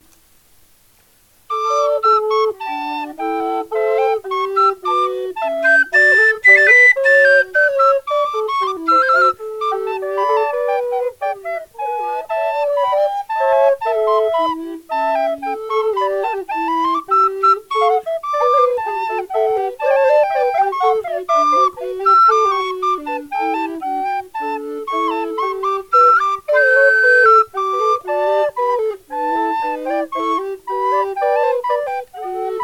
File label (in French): trio pour flute bec; blanchet remi